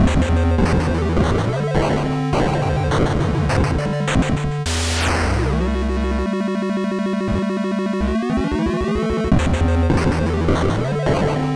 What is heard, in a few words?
good music